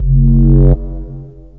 jungle rev bass

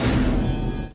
electro door slam; Weird and Interesting Hits

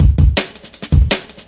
162 straight ahead, drum loop; 161 to 180 bpm

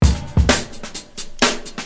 closemic groove, drum loops and breaks

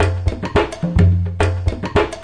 107 bpm loose brazil

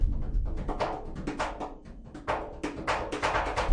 128, drum loops and breaks

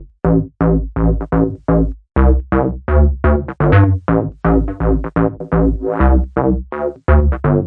125 synth bass offbeat funky; SYNTH AND ELECTRONIC LOOPS

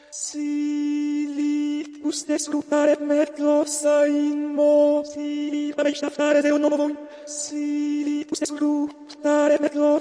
chant mantra pitch corrected; Indian Singing; VOCALS and SPOKEN WORD

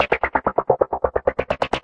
130 filter gater, synth and electronic loops; melodic loops; elab

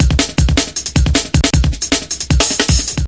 155 bpm, drum loops and breaks; 141 to 160 bpm

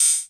KR55 O HAT; drum machines

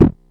silly elec fx; drums full kits; unusual kits and fx; Silly Electro FX Kit

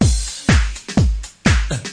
grunting gateclap, drum loops and breaks

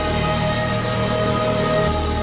big indistinct pad; Pads

